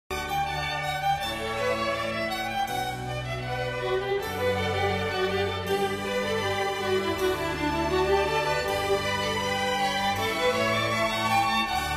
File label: canon